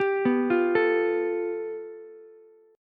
A 7th Suspended 2nd Sharp 5th, Ukulele; A7sus2#5 arpeggiated; JGuitar

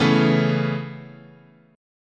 C sharp Minor 7th Sharp 5th, guitar chord

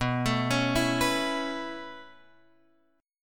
B 9th Suspended 4th B down Guitar Open F; B9sus4; arpeggiated; JGuitar

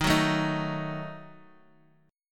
D sharp Diminished D sharp, Guitar; D#dim strummed; 10,9,9,8,x,x; 4F,4A,5C,5G,5C,6E; JGuitar

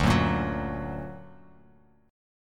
D Augmented D down, Bass Standard; D+ strummed; JGuitar

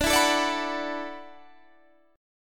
E 7th Suspended 2nd Suspended 4th, D

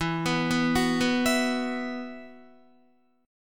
E Suspended 2nd E, down, Guitar; Esus2 arpeggiated

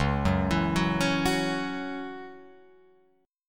Db Minor Double Flat 5th, Guitar, Open F; arpeggiated

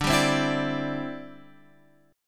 D 7th Suspended 2nd Suspended 4th, Guitar Open F; D7sus2sus4; strummed; x,9,7,7,7,9

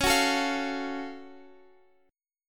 Gb Minor Major 7th Db

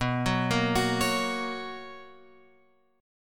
B Major 7th Suspended 2nd, chord; BM7sus2 Chord {7 9 8 X 7 9} arpeggiated

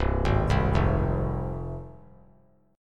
C Suspended 4th Sharp 5th, C, down, chord; Csus4#5 arpeggiated; JGuitar

